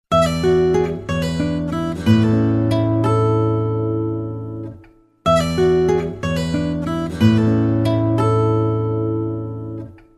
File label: nokia guitar ringtone